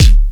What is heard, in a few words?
KICK, Hip Hop